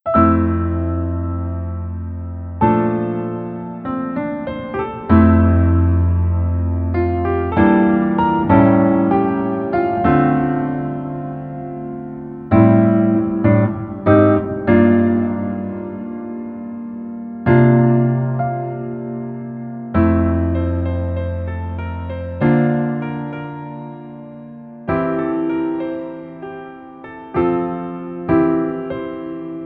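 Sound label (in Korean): Piano version 멜로디 MR; 슬기로운 의사생활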